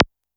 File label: korg trident